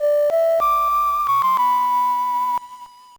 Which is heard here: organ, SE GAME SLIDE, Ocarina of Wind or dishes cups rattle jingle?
Ocarina of Wind